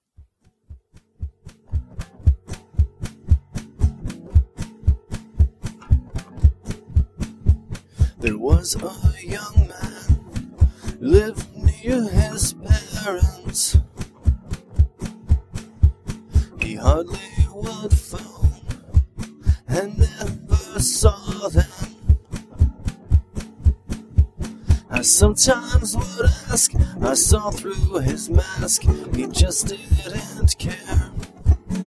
percussive; Phase based harmonic percussive separation; reference algorithm

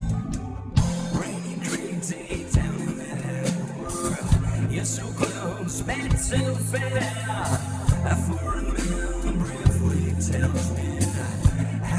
percussive

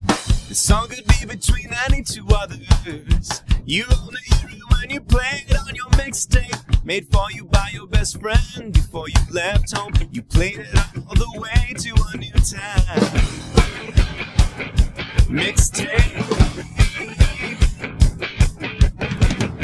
percussive; Phase based harmonic percussive separation; reference algorithm

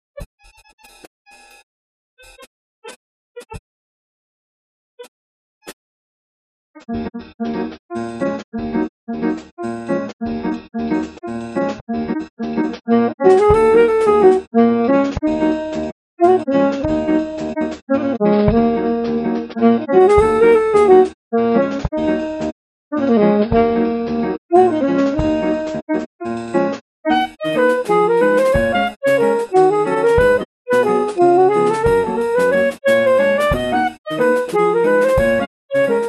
Noise solo

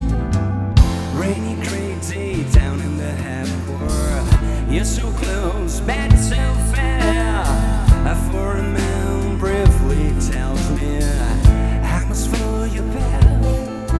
backing

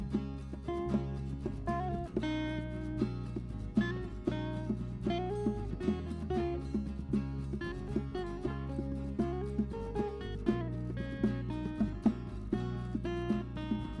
original accompaniment

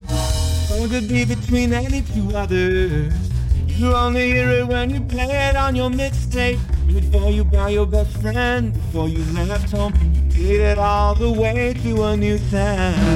harmonic; Phase based harmonic percussive separation; reference algorithm

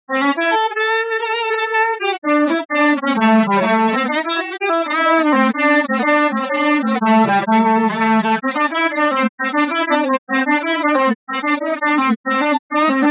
solo

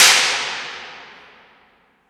Imp Hallway, Reverb

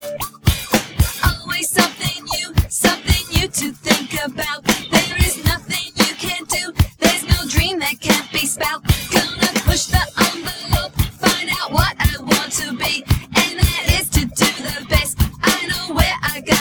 percussive

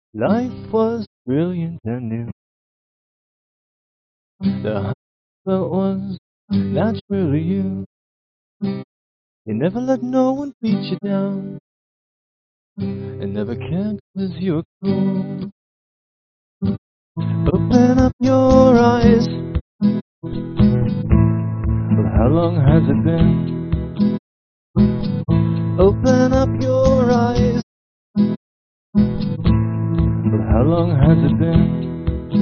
solo

